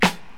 90s hip hop snare single hit e key; snare drum samples

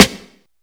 dirty acoustic snare g sharp key; Mono; snare drum

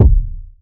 rich low end kick drum c sharp key; kick drum samples

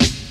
short steel snare drum sample e key

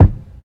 short bass drum sound b key

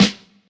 acoustic snare g sharp key; snare drum

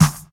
original snare sound d sharp key; snare drum samples

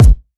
short kick drum sound G key

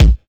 dry bass drum single shot a sharp key

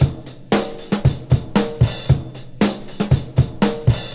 116 bpm breakbeat sample c sharp key

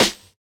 juicy acoustic snare sound f key; snare drum samples

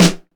clear snare f key

